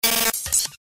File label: Modulated digital glitch